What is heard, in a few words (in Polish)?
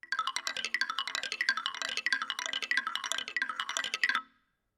Tonblok; Instrument wykonany z drewna bukowego o brzmieniu płynącej wody- uspokajający dźwięk dla d; perkusyjne; Stuk i Puk - Dziecięce Instrumenty Muzyczne